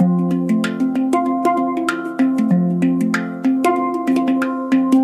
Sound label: hang pan